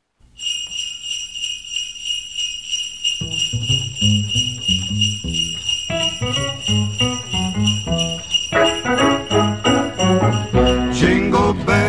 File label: Jingle Bells